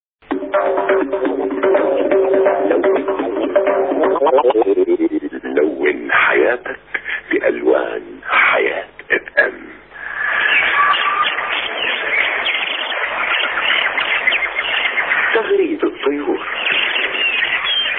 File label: interval signal; hayatfm